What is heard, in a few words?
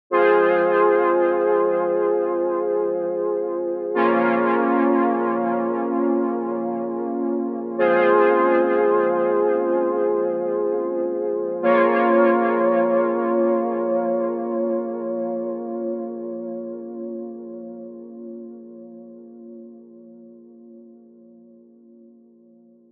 Sparkle Up 125 BPM F Min Chords; Melody; Loop Stems; Cymatics; 2022 Melody Collection Platinum Expansion